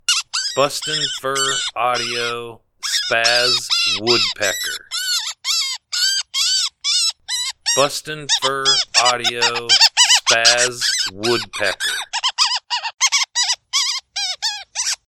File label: Spazz Woodpecker